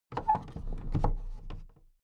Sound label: big plastic case put down